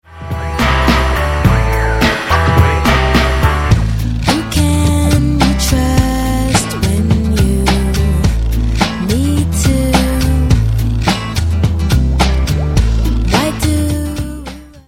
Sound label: MIDI